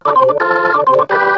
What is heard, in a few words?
173 two chorder organ; KEYS ORGAN PIANO LOOPS